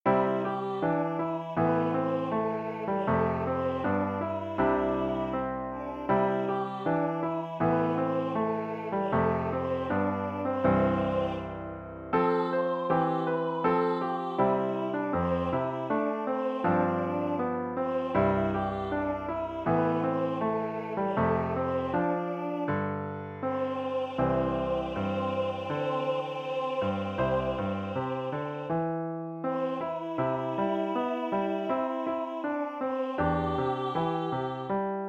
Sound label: Letting Go Chorus MIDI